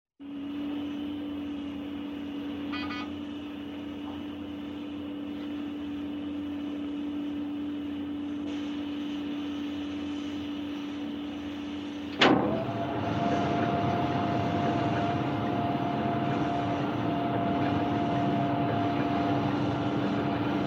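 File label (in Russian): звук перископа; Звуки подводной лодки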